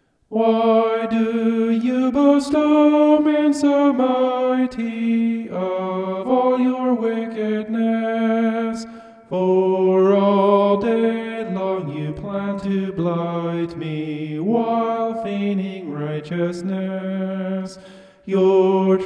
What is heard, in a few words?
vocal homoph; psalm 52